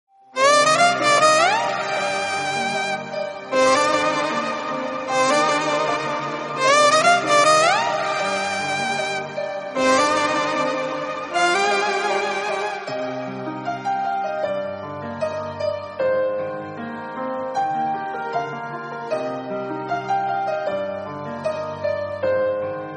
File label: Instrumental BGM